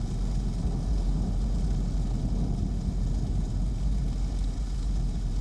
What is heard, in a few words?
Ambience Loop, outdoor